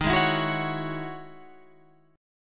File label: E Major 13th chord